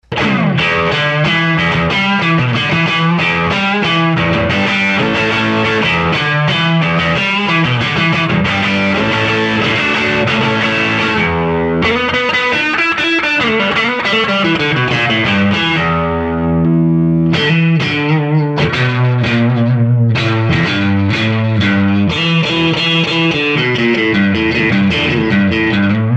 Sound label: Crunch; Herbbie 60 watts